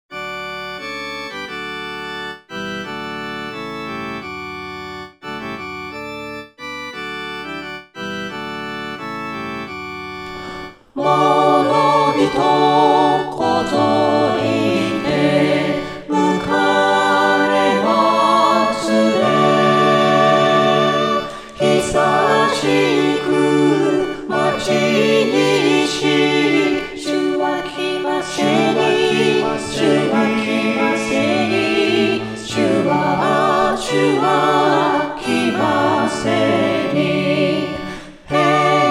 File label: chorus; morobitokozorite